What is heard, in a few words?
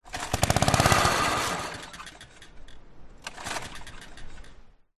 lawn mower vintage ride on British Anzani Lawnrider 24in Start Dies; selectmood